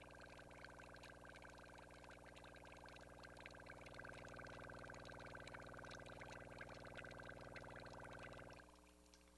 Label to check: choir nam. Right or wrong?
Wrong